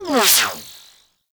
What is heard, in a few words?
Vomvalyst Laser Whizzby; Warframe; Teralyst